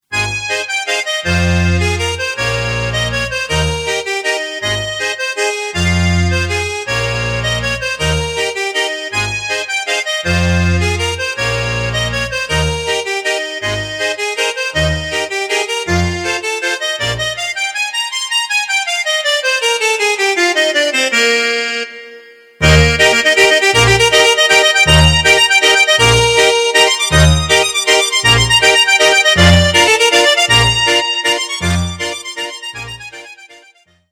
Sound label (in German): Schwyzeroergeli Musette